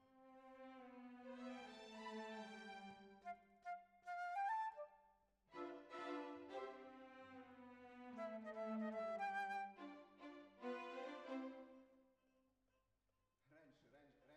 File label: Oboe